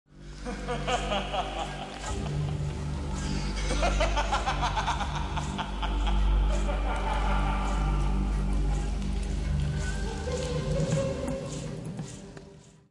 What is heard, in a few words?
laugh